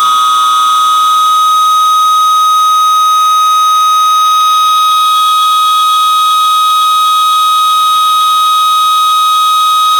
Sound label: dc shock defib sound, medical equipment